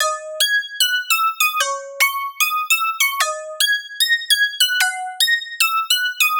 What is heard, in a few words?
Lead Loop D# Bell, 150bpm; Dubstep; Ghosthack; Dubstep Sound Arsenal